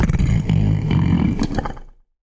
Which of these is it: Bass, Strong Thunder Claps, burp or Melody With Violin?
burp